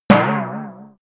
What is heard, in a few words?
boing drum